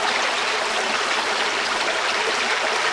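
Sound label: water fire out loop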